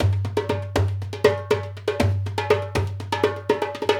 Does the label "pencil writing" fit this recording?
No